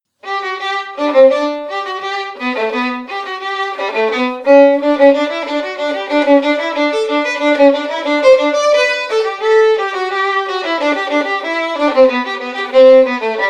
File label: Violin Solo; Brandenburg Concerto 3